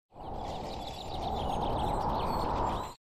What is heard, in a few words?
partly cloudy weather effect